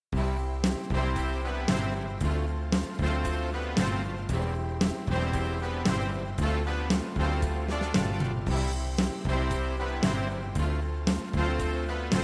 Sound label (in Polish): hymn szkoly linia melodyczna